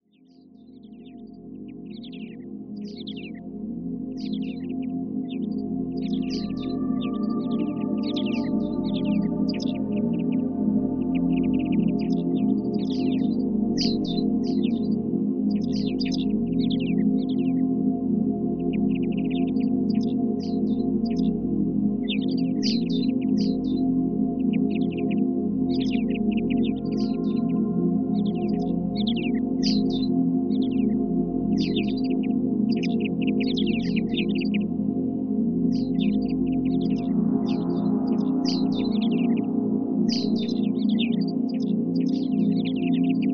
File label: Light Meditation with Forest Sounds